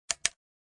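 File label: flashlight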